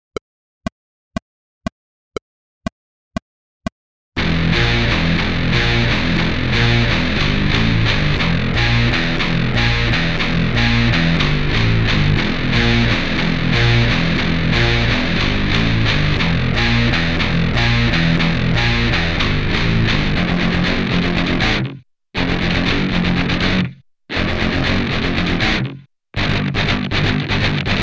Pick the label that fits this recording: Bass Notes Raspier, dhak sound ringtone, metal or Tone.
metal